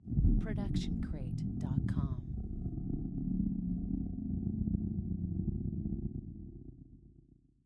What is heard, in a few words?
Low Machine Charge Down; SoundsCrate